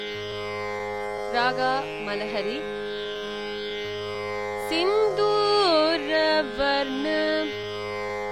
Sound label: Malahari Phrase, carnatic; carnatic basic level